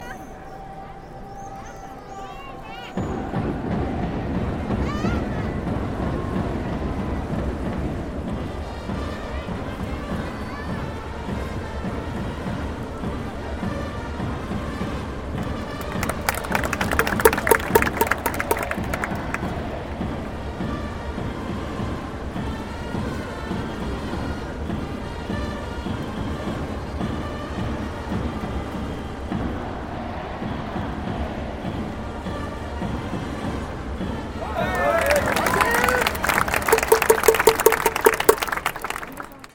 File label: baseball stadium